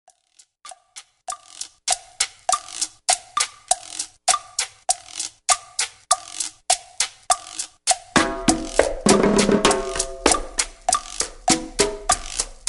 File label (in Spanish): ritmos